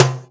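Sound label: Boss DR-202 percussion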